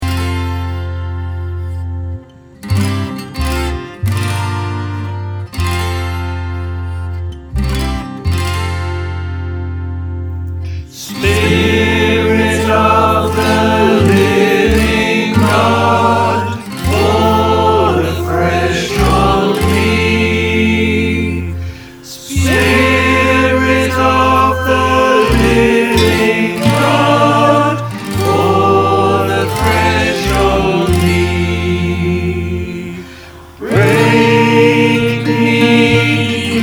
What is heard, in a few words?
choir